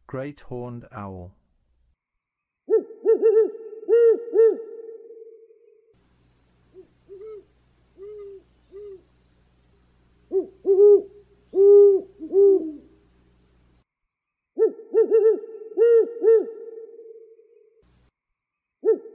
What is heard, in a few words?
Great-Horned Owl; North America